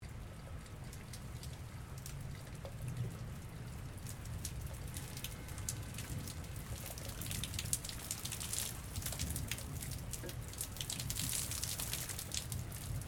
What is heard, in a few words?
Waterflow Medium From Drain, Severe hail storm; Nature